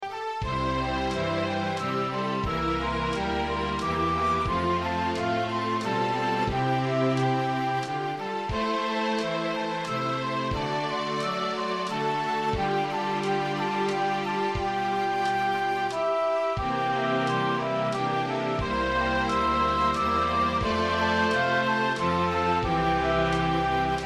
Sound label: Orch; Amazing Grace